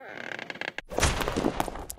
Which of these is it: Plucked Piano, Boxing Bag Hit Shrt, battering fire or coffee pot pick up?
battering fire